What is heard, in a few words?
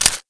mag out, weapons